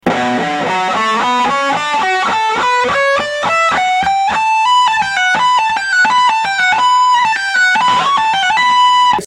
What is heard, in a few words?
play guitar